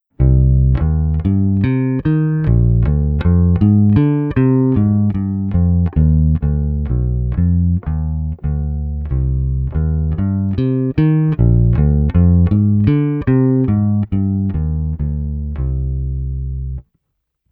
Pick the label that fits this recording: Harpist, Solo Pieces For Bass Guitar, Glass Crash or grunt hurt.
Solo Pieces For Bass Guitar